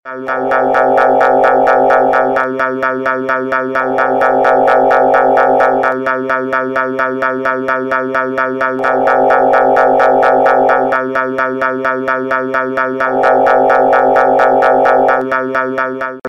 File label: Wake Up Alarm